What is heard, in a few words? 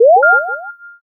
unlock